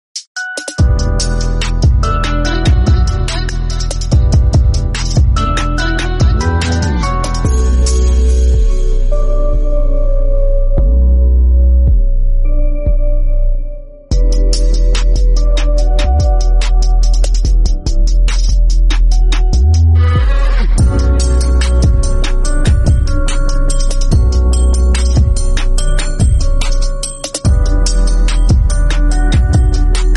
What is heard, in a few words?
Free Beats Instrumentals TRAP